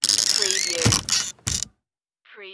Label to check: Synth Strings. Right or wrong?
Wrong